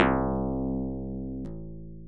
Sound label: acou bad yarn